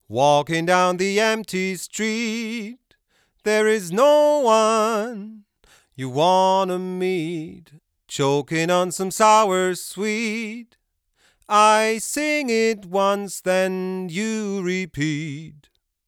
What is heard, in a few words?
Millennia NSEQ HF Male Vocal 2 8 kHz Shelving 8 dB